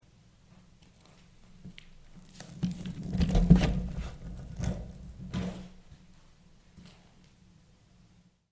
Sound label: skateboard